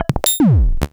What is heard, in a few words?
Serge Modular